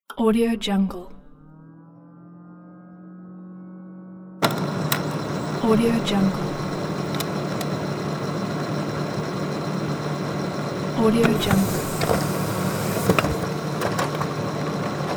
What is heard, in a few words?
printer printing; wistansound